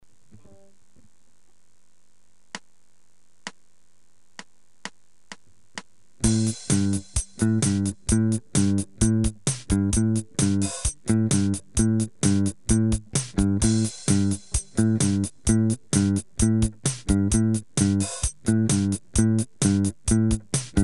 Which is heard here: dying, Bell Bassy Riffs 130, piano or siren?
Bell Bassy Riffs 130